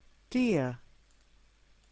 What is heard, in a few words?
deer